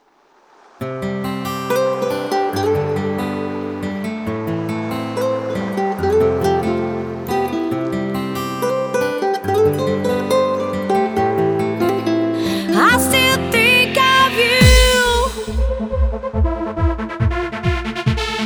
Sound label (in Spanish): (Version guitarra)